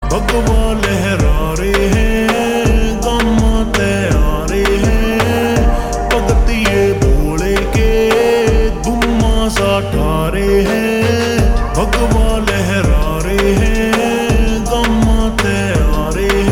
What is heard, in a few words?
Ringtone Slowed Reverb